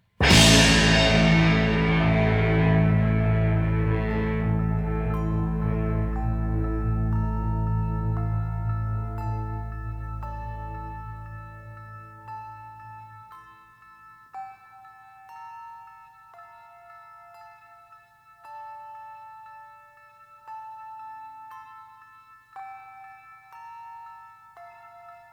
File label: concerto for jazz-rock orchestra